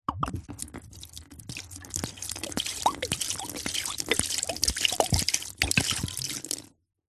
food drink beer pour tip out from glass bottle onto ground; zapsplat